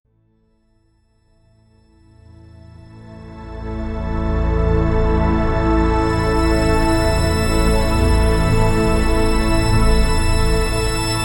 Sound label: luxury logo reveal sound; branding